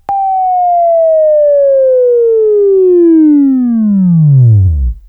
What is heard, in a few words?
Buzz, Sweeping Training